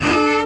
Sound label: orchestra string hit D; Orchestra String Hits; PADS and STRINGS